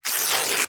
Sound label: gift open; Cozypaw-Hospital